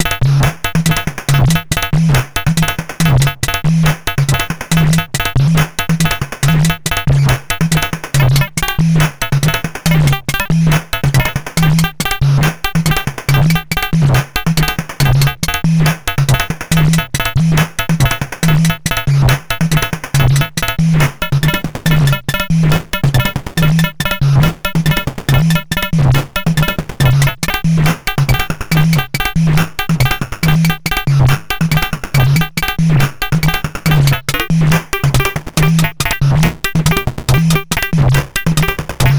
Error Instruments Drums Trio

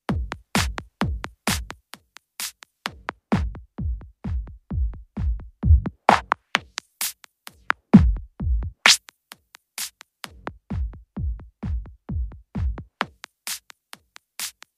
evo bp

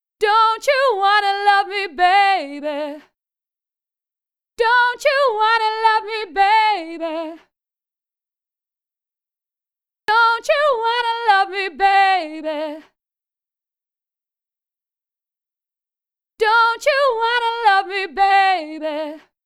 DNA dont u o cdur jap arab

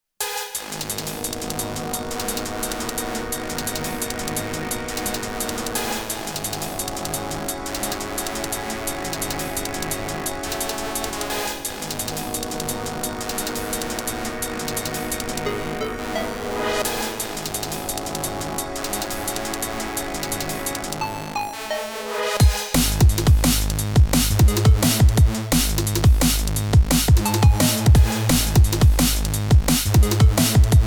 Polyend Play DnB

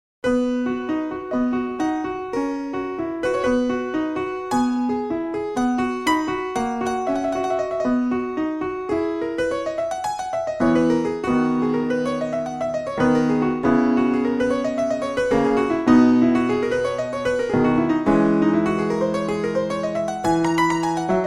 Early Piano

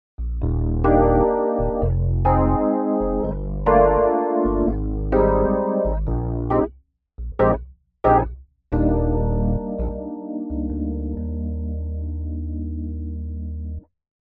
Yamaha CP88 E Piano

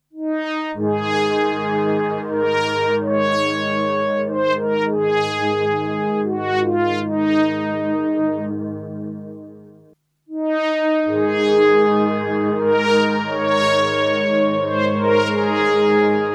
Yamaha SPX90 Hall Synth

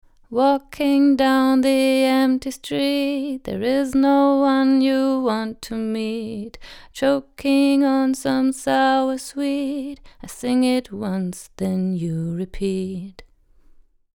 Female Vocal 10 kHz Peak 4 dB; Millennia NSEQ HF

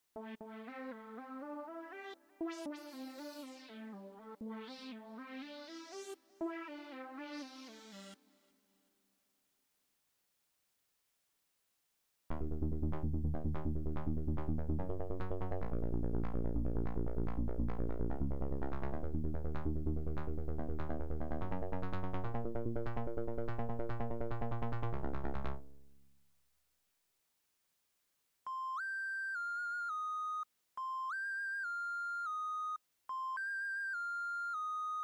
Pop Keys

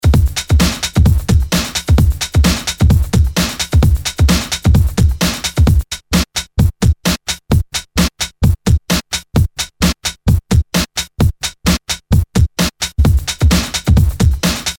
Loop Chop

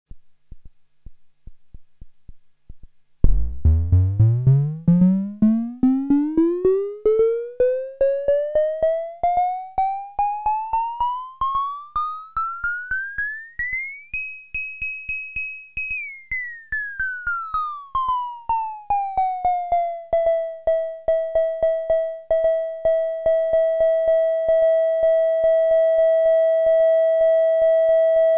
AVP Synth ADS 7 Generator Tone

